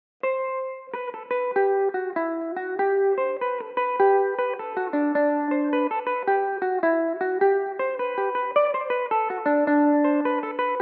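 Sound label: D mixolydian, Irish mandolin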